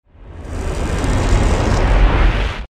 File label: Teleport